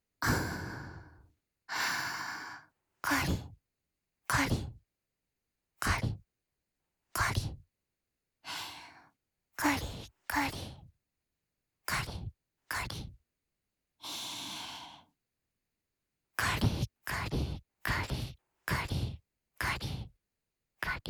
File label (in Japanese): 乳首責め（かりかり・右）（SEあり）乳头刺激